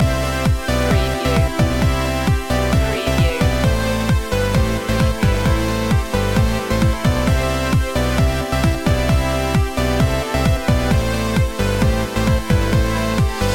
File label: dreamwave loop